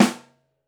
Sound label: SN Mono, Snare